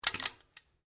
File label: RIFLE REMMINGTON700 7.62MM MAGAZINE RELEASE; A selection of domesttic firearms; Rifles; Guns